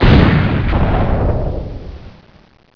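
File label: nuclear explosion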